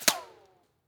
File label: bullet ric; weapons; rics; Counter Strike Model Pack; CS2